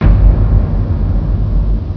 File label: thump; Quake; PainKeep